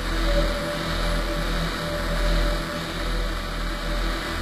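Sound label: fizzler loop; Source Engine; Portal 2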